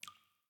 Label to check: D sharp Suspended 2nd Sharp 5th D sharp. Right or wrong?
Wrong